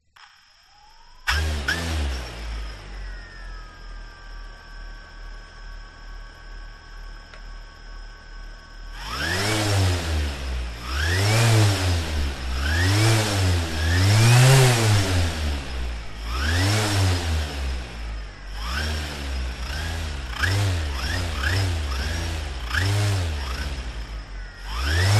transport drone dji phantom 3 start motors run rev multiple off props on